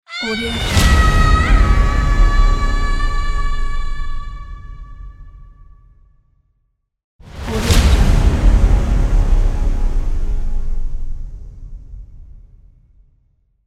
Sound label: epic trailer logo ident; orchestralis